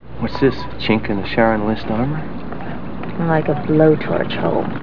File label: blowtorch